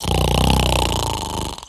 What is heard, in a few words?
Snore; Tent